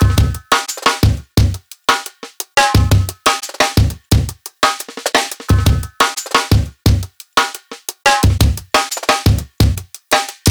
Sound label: Live Jungle Drum Break, 175; JungleBreaks24